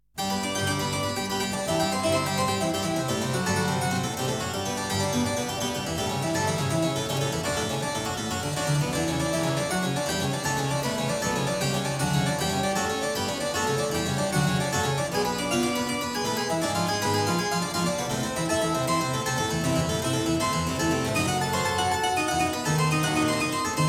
Prelude in G major, clavier; Prelude & Fugue No.15; BWV 860; BACH; WELL TEMPERED CLAVIER; BOOK I

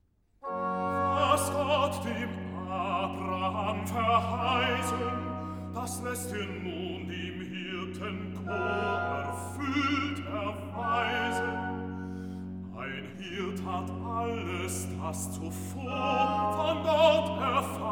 Recitative; Was Gott dem Abraham verheißen; BACH; Christmas Oratorio

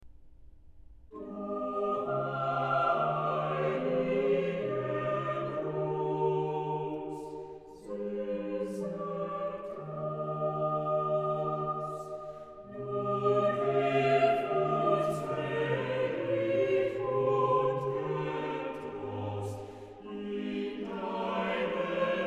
Chorale, organ; BWV0226; Du heilige Brunst, sьsser Trost; BACH; BACH ORGAN WORKS; CHORALE PRELUDES

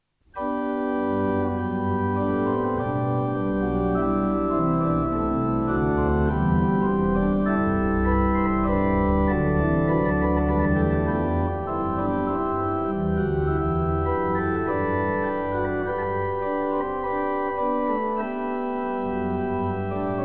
organ, choral preludes; Wir danken dir, Herr Jesu Christ; Bach; Bach Organ Works

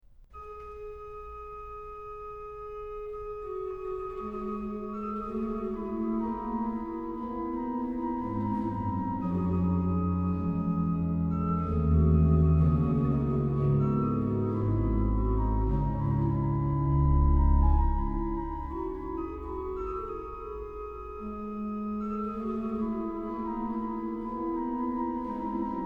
Das alte Jahr vergangen ist, organ; BWV0614; BACH; BACH ORGAN WORKS; CHORALE PRELUDES

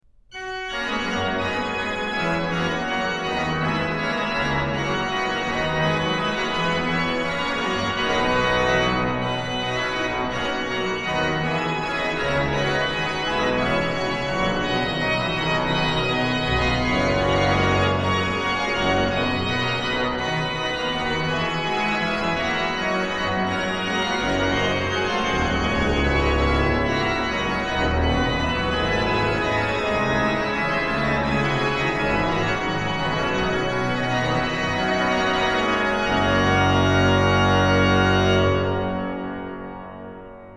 ORGAN, Chorale Preludes; BWV0631a; Komm, Gott Schofer, Heiliger Geist; BACH; BACH ORGAN WORKS

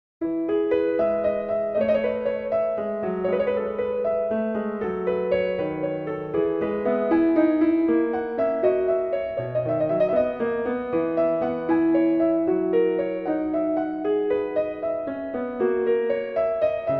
Prelude in E Major; BWV 854; Bach; Well-Tempered Clavier; WTC I

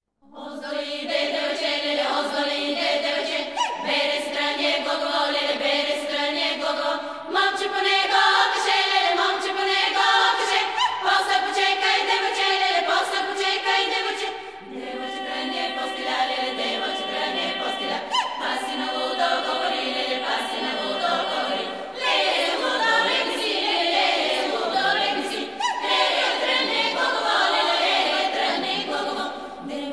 Bulgarian Female Choir; World music

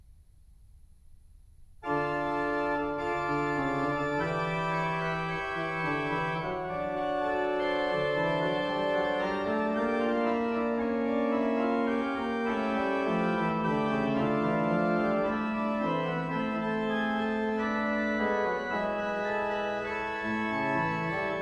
Prelude in D Minor, ORGAN; BACH; Toccatas, Fantasias, Preludes, Fugues

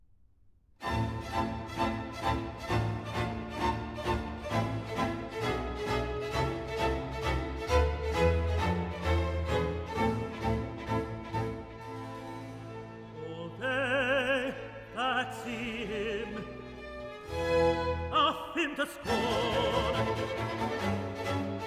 Recitative; All they that see him laugh him to scorn; HANDEL; MESSIAH

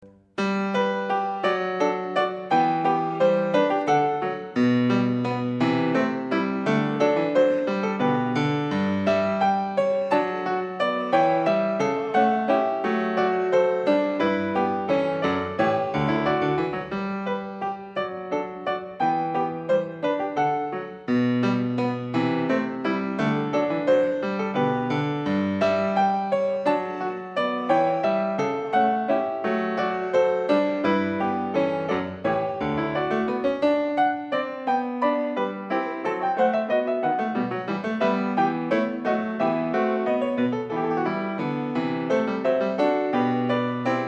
Bach Goldberg Variations a 2 Clav, PIANISTS

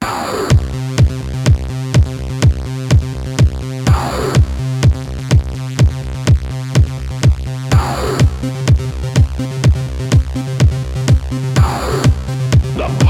Techno Terror

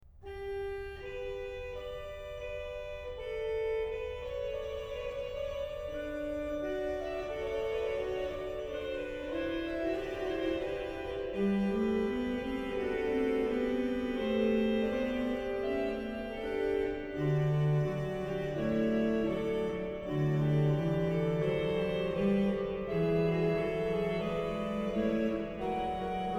Herr Jesu Christ, dich zu uns wend, organ; BACH; BACH ORGAN WORKS; CHORALE PRELUDES

